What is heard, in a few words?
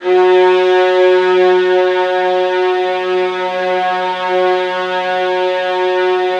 violins G#3; ILIO; Synclavier Strings